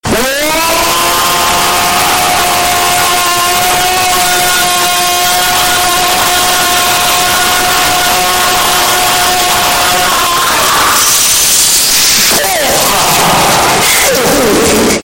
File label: ooooooaaaaaawwwww; gmod